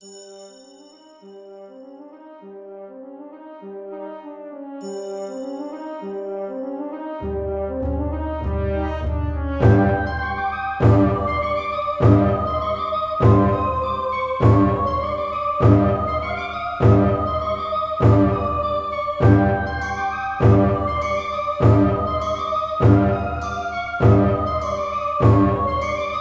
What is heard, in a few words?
song desperate defense; dark deity